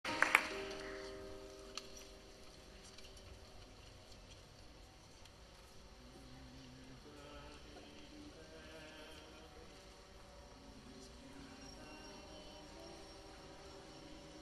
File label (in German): Unterstufenchor Weit weit von hier Aus Finnland Traditional